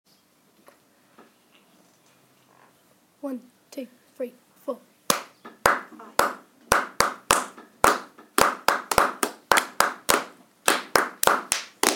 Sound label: clapping